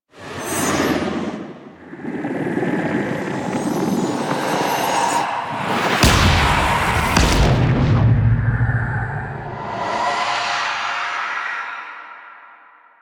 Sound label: Operator Blast Queen; Warframe; The War Within